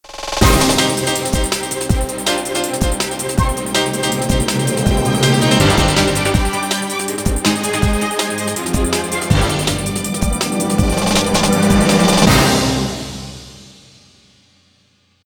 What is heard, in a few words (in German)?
Jingle; ORF Sommergespräche